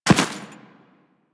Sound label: Run Dirt, Footsteps; Warframe; Teshin